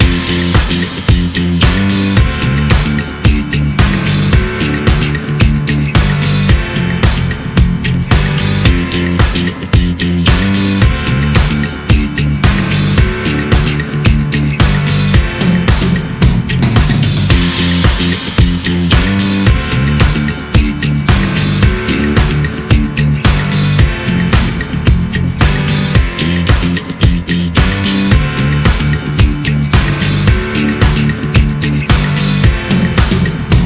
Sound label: Instrumental